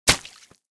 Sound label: lucky Mug Fall Down; finn